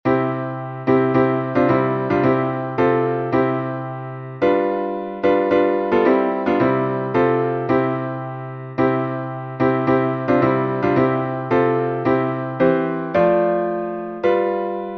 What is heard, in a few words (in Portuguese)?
salmo instrumental